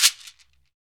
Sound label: SHAKER, Afro Shakers, Shake&Rattle